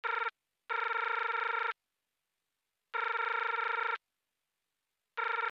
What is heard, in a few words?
phone ring tone